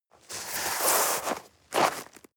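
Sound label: step snow walk limp single, footsteps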